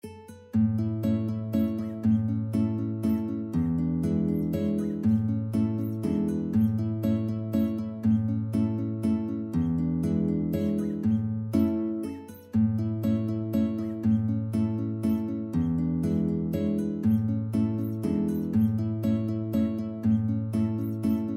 2 GUIT; joseph kuffner; op168

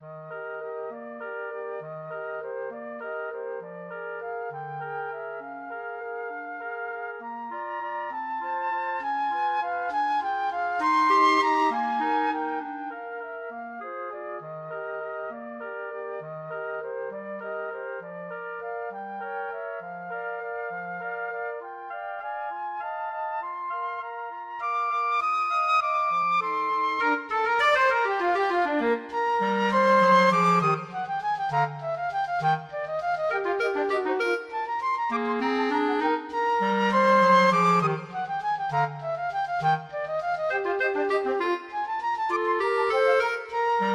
waltz, 2 flutes clarinet

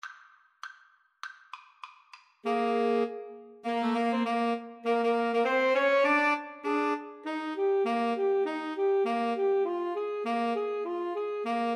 2 Altos Tenor Sax

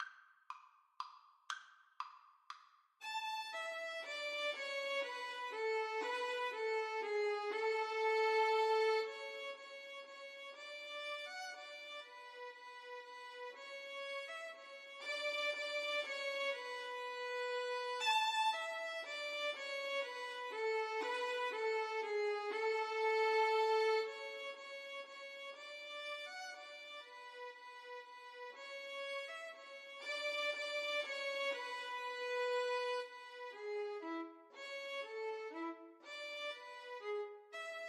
Menuett violin duet

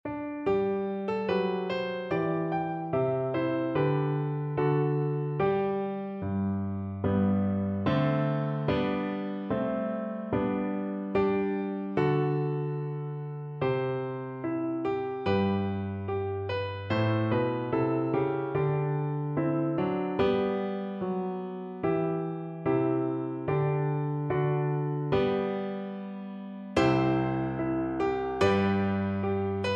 roving viola

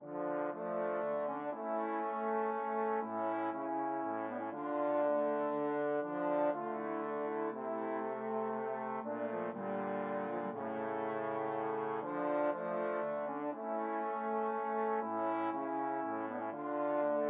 pastorale trombone trio

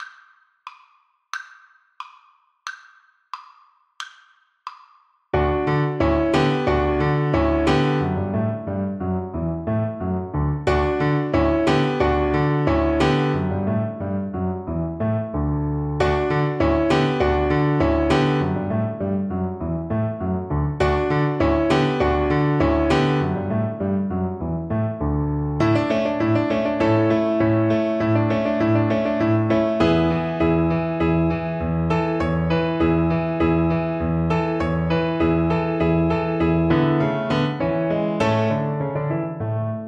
musette, cello

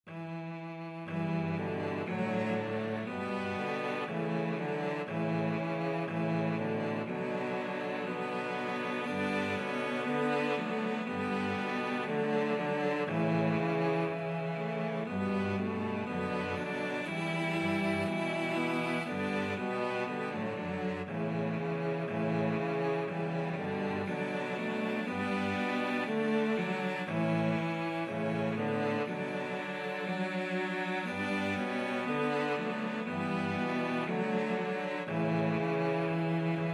cello quartet

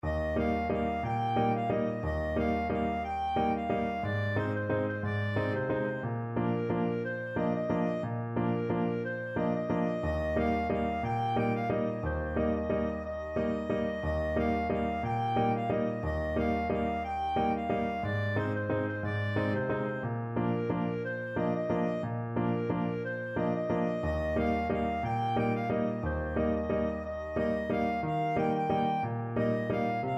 lathe di chadar, clarinet

